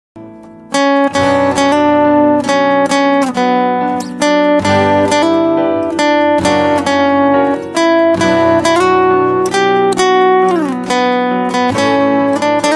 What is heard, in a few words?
Flute; Mahabharata